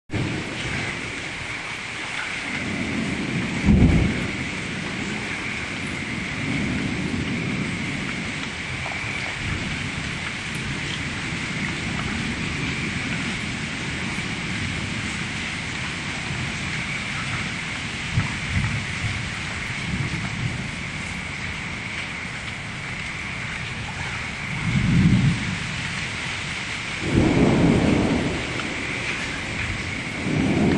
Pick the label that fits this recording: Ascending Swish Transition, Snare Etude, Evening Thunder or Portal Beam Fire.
Evening Thunder